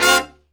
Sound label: D BRASS; E-MU Formula 4000 Series Vol. 1; Hip Hop Nation